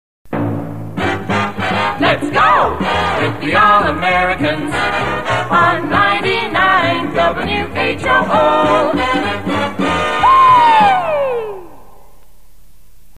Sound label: jingle; Lets Go with the All Americans